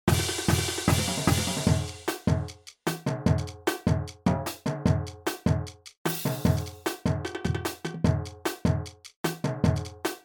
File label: stadium groove, brass